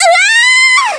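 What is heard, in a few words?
die, alien controller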